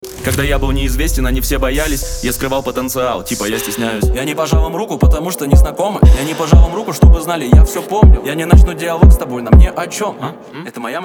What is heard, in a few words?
phonk remix; rjep i hip hop